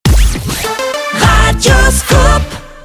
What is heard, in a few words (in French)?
jingle; radioscoop